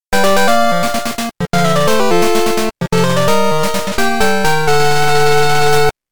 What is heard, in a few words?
Fanfare!